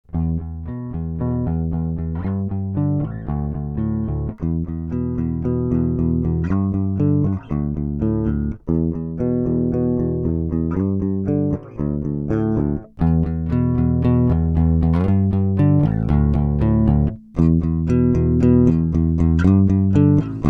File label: pick